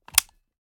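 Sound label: selector off, weapons foley; gmod; uzulu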